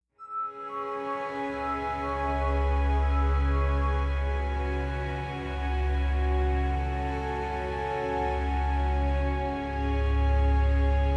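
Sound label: Music Volcano; From Dust; PS3; Xbox 360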